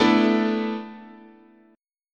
A Suspended 2nd Suspended 4th, guitar chord

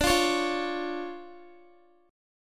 D Minor Major 7th D down Guitar; DmM7; strummed

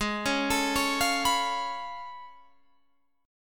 A Augmented Major 9th G sharp

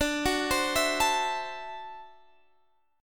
D 9th Guitar Standard, chord; arpeggiated; JGuitar

